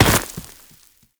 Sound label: dirt impact bullet; physics; surfaces